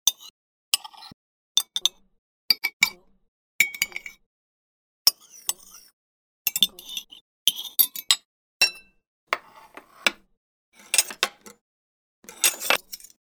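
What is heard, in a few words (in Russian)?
western cutlery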